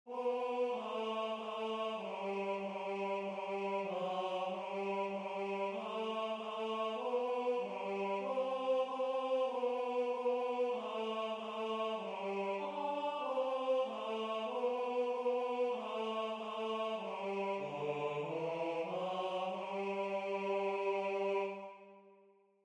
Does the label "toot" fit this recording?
No